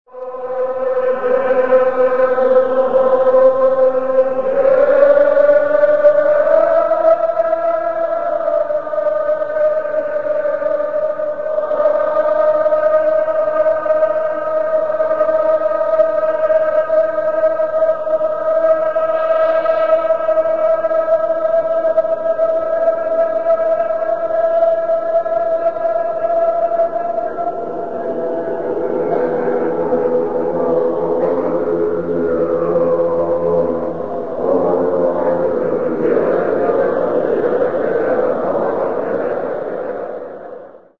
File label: monk chant; india